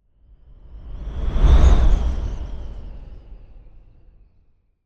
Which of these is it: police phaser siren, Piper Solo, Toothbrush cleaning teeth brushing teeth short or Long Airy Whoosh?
Long Airy Whoosh